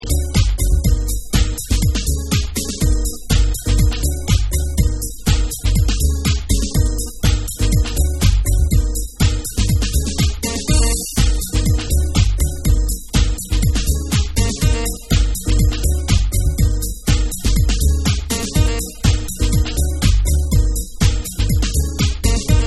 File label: New Organ Instrumental Mix